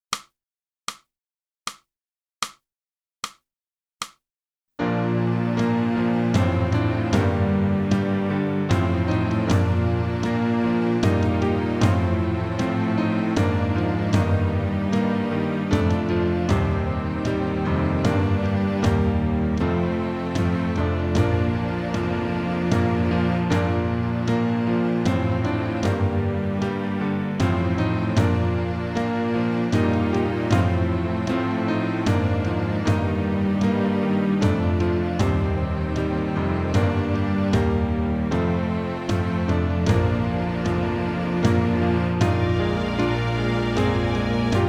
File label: sax